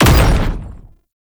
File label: Perfect Heavy Attack Impact, Weapons Melee